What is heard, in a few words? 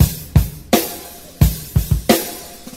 live band, Drum loops Boombap